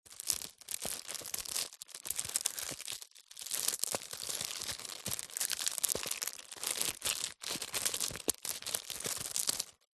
unopened greetings card in cellophane wrapper handling